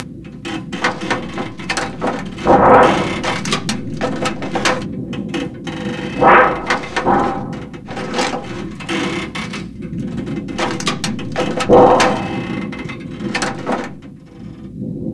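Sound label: metal corrugate rattle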